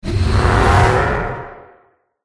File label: mage buff